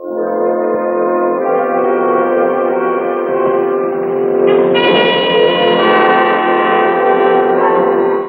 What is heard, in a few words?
Ancient Music FX, Industrial